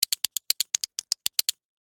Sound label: Legos Snap Together, Foley, Legos, Snap, Together, Fast, Build, Plastic, Toys; BlastWave